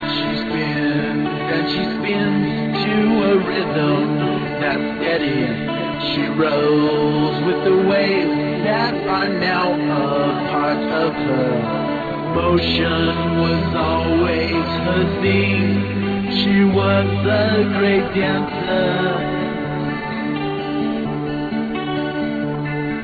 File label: death waltz